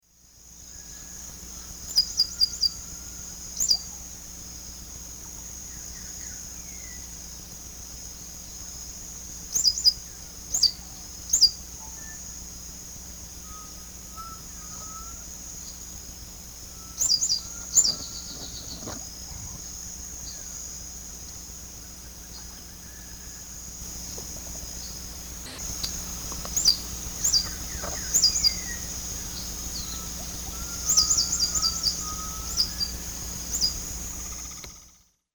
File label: Leucippus chlorocercus, calls; S. America; Eastern Lowlands